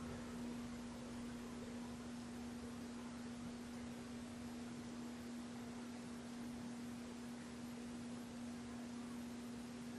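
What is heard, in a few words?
Ballroom Static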